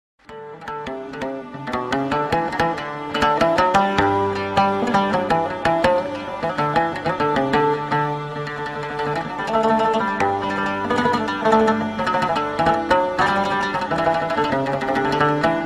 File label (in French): musique